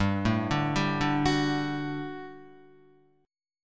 G Major 7th Suspended 2nd, Guitar; GM7sus2 arpeggiated; 2,0,2,0,2,2

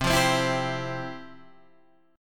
F Major 7th Suspended 2nd, C, guitar

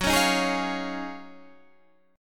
D sharp Minor 11th F sharp, chord

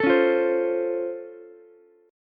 Db Augmented Major 7th, down, Ukulele; strummed; JGuitar

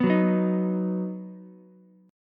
Eb Minor Sharp 5th, Ukulele; Ebm#5 strummed; JGuitar

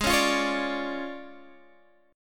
D Minor Major 7th Flat 5th, Ab

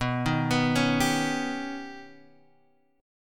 Eb Augmented 7th

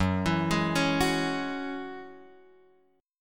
Gb 7th Suspended 2nd Gb Guitar Drop D

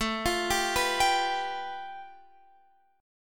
A 7th Suspended 2nd Sharp 5th, Guitar, Standard; A7sus2#5 arpeggiated; JGuitar

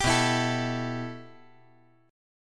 B 7th Suspended 4th Sharp 5th

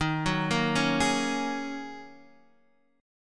D sharp Minor 11th D sharp Guitar Open D, chord

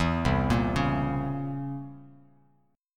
C 7th Flat 9th C down Bass Standard; C7b9 arpeggiated; JGuitar

